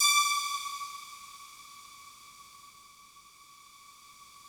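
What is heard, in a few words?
FOG ATTACK D5 Left, Yamaha VP-1; Music Store; 90's Sample CDs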